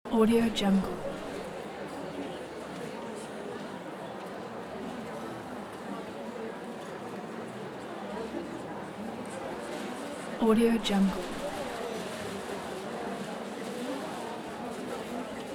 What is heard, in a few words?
audience chattering; sviridpavlov